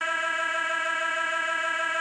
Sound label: Instrument